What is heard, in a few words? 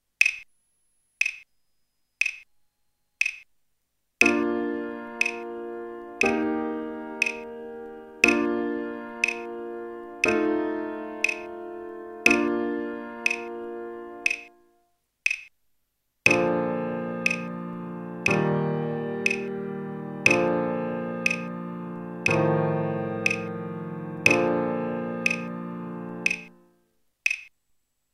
code progress